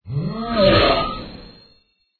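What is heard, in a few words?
occultist attack voice up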